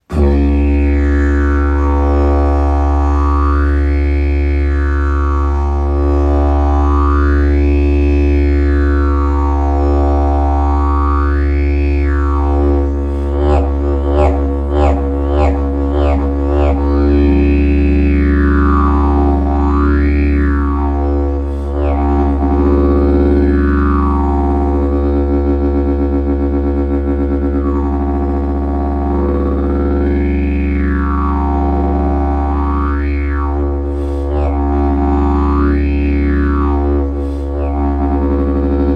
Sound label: Didgeridoo sound